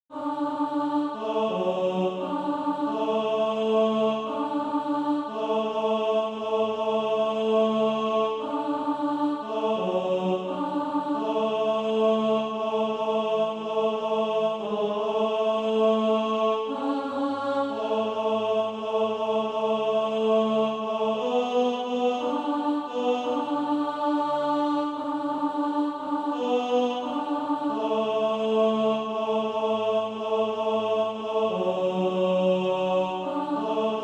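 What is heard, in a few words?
tenor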